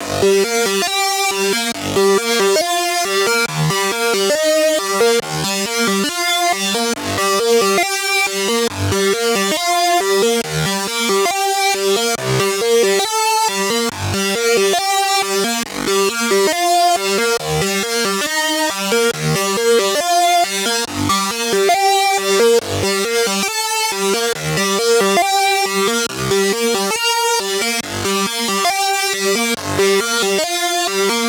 Mainlead DRY, 138BPM, G minor; Melody & Synth

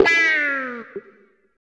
125 e, guitar licks; house; Vocal House; PS-28 Vocal House Samples; instrumentloops

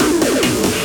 Fill 140 BPM

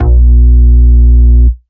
Root A#, Ultimate Bass; Vengeance Samples

